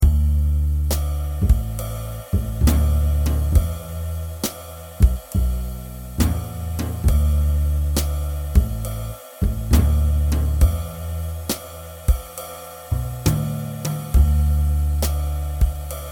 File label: jazz finger style 2 5 3 6, guitar